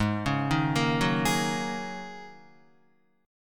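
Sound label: A flat 9th Suspended 4th A flat Guitar, chord; arpeggiated; 3,4,3,3,6,4; JGuitar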